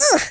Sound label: oof, speech, Alien; Worms3D